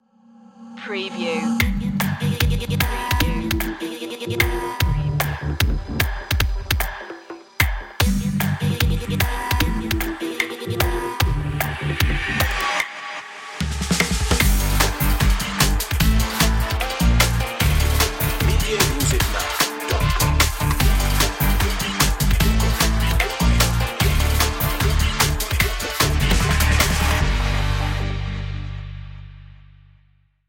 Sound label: fast edgy attitude